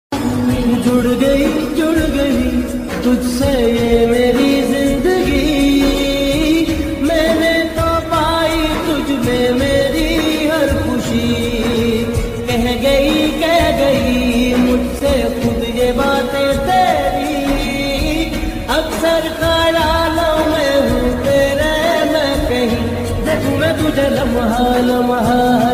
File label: Love Song Ringtone